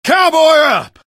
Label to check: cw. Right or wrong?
Wrong